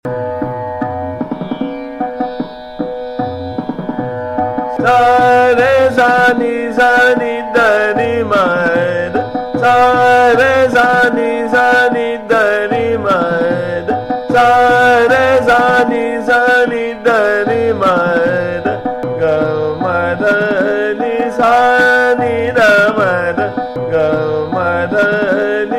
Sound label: Sargam Sa Re Sa Ni Sa Ektal Drut, Sohani